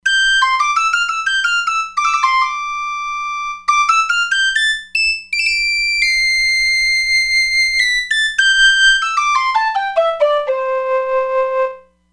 Penny Whistle, E-mu Proteus-3; Factory patches

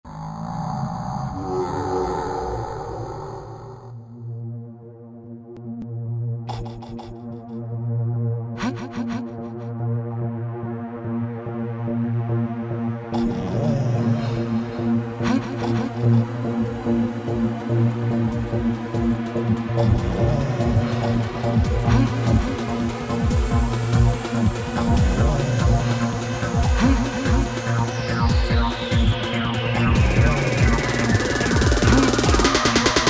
TRANCE; Factory Demos

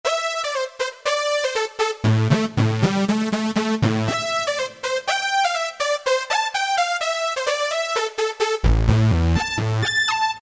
Alesis QS8; Trilogy Ld; Factory Preset examples

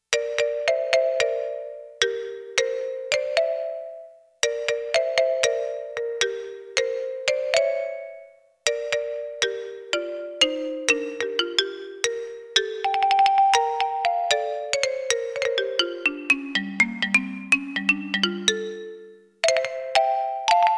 MARIMBA, Roland JX-1; Factory presets

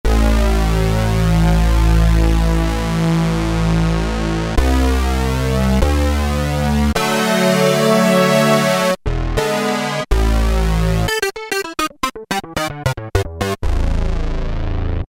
Sync Pad, Roland MKS-70

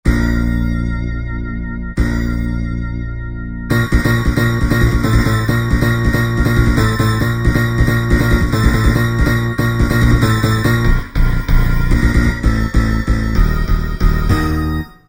Rave Hits

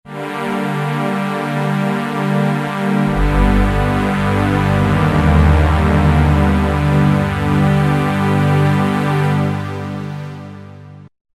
BIG thin Pad, Roland V-Synth; Factory Patches

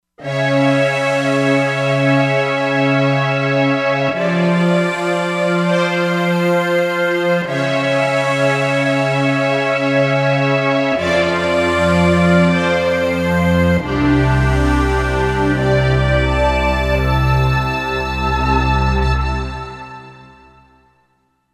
Steam Orch, Korg MSC-07S Synth; Korg MSC-07S Synth 2

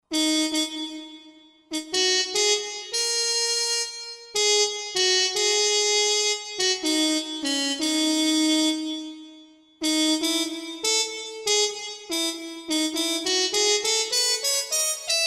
Mute TP mod, Roland JV-2080

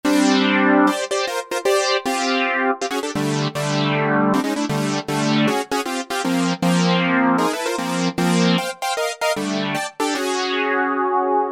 Lead, Alesis Micron; Factory Programs

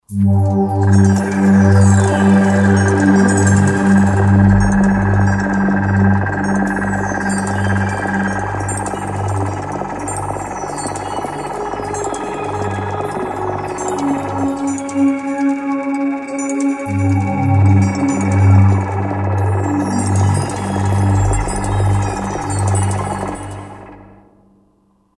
Roland JD-990; Factory Patches examples

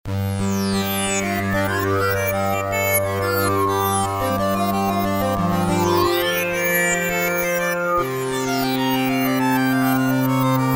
Sync Twice, Roland V-Synth; Factory Patches

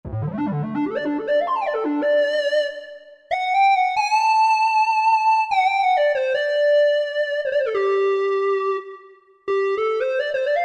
Classic Lead, vintage synth; Roland SR-JV80-04

